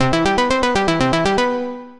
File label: Dark Forest Synth Sequence; Synth Rhythmic